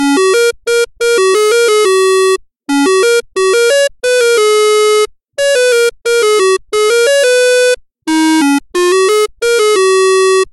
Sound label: Brick Game